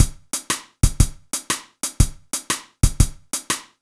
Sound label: triplets